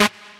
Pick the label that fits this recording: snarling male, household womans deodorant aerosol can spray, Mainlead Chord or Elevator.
Mainlead Chord